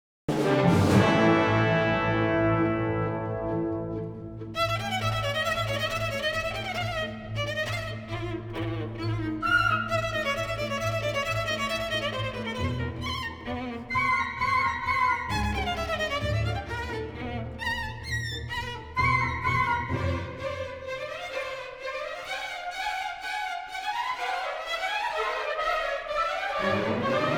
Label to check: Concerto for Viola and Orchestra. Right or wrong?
Right